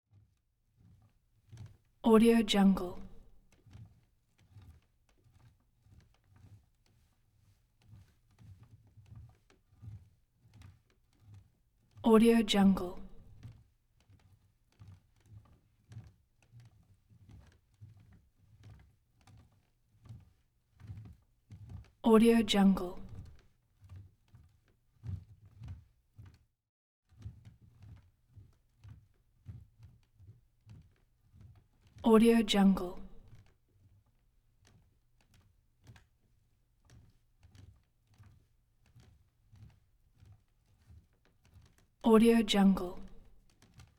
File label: rocking chair